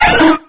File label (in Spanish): Grito de Wigglytuff